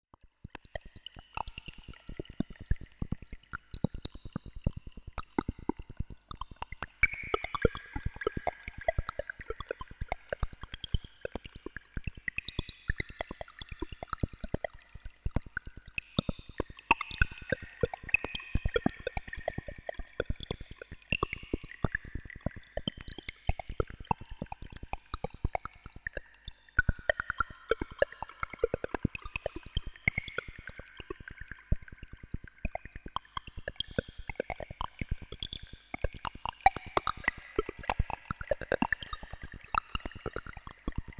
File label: Dropping Beats, RS 370 Polyphonic harmonic generator